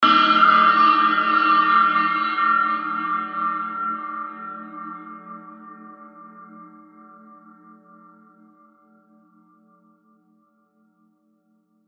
tibet sbub chai cymbal

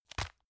tissue package drop soft

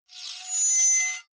sword blade screech high

